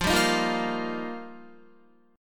F 7th Suspended 2nd Suspended 4th, Guitar; F7sus2sus4; strummed

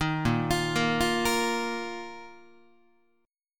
Bb Suspended 4th Bb down Guitar; Bbsus4 arpeggiated; 6,6,8,8,9,9

